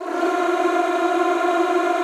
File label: F3, Extended Classical Choir; Best Service